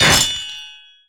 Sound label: Christmas Bell Destroyed; fowl; Christmas Bell Blocks